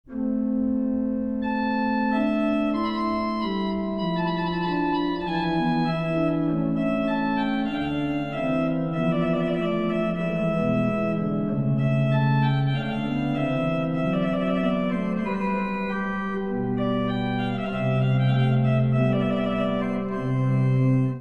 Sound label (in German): kornett, orgel